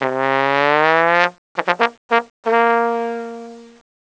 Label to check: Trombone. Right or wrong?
Right